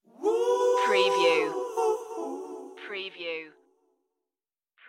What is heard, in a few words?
rainbow pop stinger